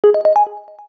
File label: Active, keytone; Samsung SGH-A257